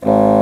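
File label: Contra Bassoon, G#0; Digital Sound Factory Virtuoso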